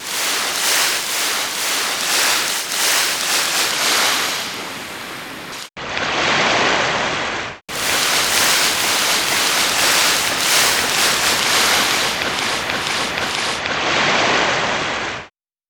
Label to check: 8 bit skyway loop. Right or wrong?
Wrong